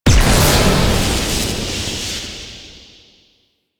Void Dragon Orb Proj Explosion Lightning; Enemies; Abilities; Warframe; Duviri

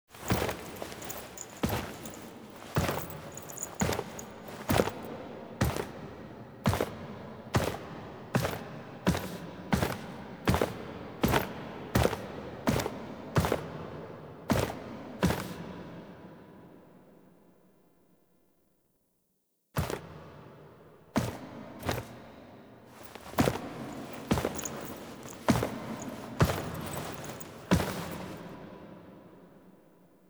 Foley; Warframe; Quests; The New War; Sealab; Hunhows Lair; Drifter

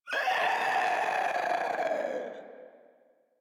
Infested Runner Death; Warframe; Runners

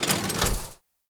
Mech Step Arm Down; Nechro

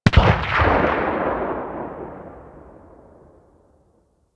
Weapon Enemy Tail Outdoor; Warframe; Weapons

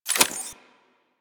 Reload, Pistol; Amphis

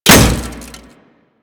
Bullet Impact Metal No Whizz; Warframe; Bullet Impacts

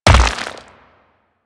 Rock Elemental Footstep Default; Warframe; Brawler

